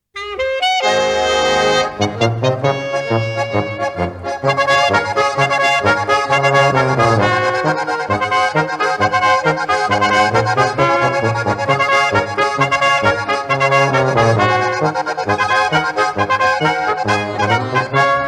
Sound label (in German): Trompetenecho